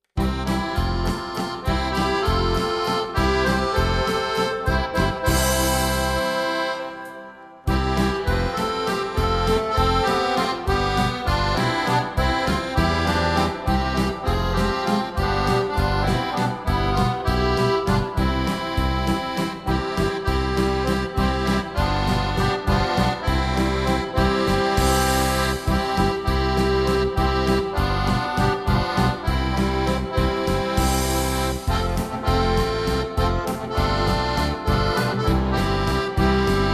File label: Balkan